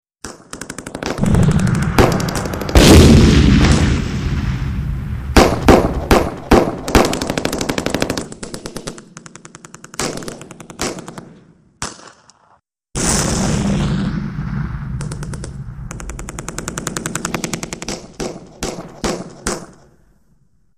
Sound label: firefight